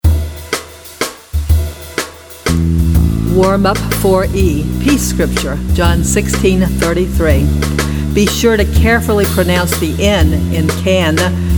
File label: Vocal Warm Up